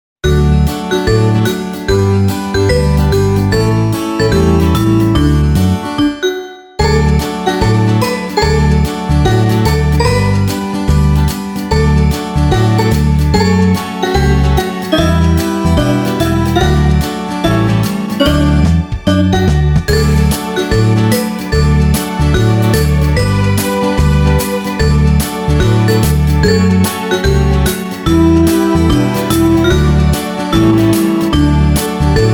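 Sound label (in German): Auf der Wiese steht 'ne Kuh, Gitarre Melodie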